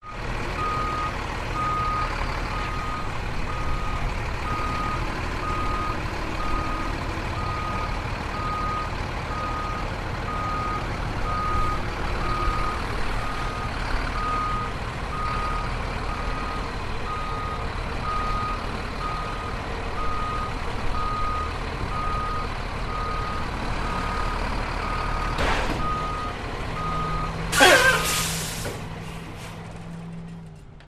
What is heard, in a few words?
Backing Up